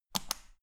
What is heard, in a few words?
power button turn on off hard quick